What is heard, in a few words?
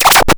Morse Coder DJ; Cwejman S1; DevSnd Cwejman S1 - JK